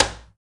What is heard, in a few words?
Cajon, Percussion; Roland BK-5